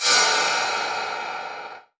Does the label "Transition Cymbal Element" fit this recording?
Yes